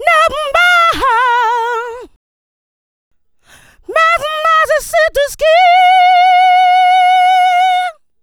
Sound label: VOX, Funky Vocals; USB Soundscan vol.18